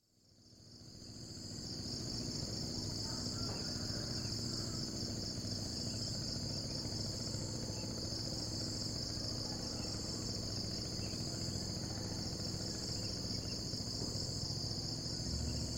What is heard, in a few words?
Laos soundscapes